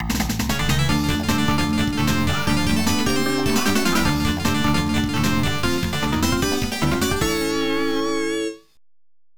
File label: Goal; Tecmo World Soccer 96; SNK Neo Geo arcade